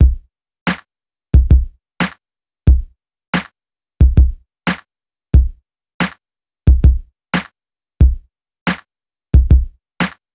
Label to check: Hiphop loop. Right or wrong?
Right